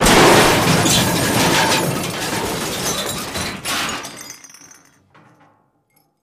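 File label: Metal Crash Big Scrape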